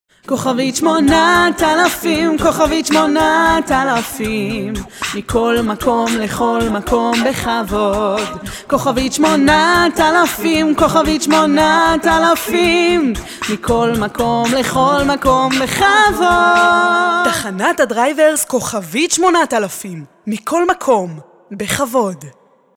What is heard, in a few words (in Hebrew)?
מיקס